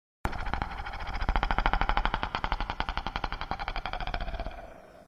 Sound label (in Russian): strekotanie hischnika